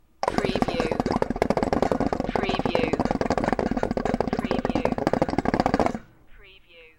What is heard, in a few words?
Hookah